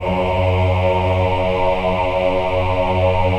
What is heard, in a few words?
VIB F#2, VIBRATO, Voices; Spectrasonics